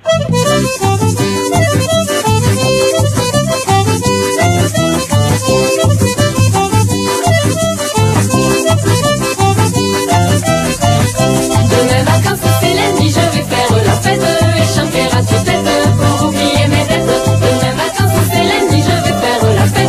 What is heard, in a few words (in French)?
Chanson occitane